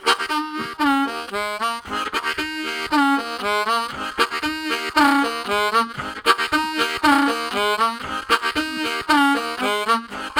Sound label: Chuckadown, Harmonica Riffs; Zero G